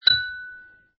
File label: coin; inlogic-arcticpong